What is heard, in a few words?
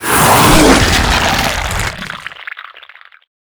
bite; CosmicRage; ships ratika